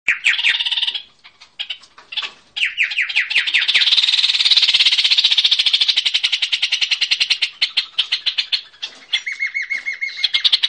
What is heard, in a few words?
nuthatch sound